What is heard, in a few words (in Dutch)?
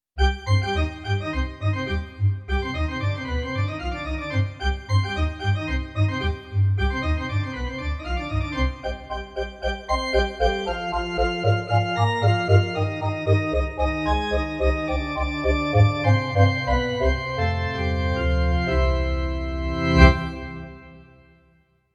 Kerkorgel, Roland FR-8X V-Accordion; church organs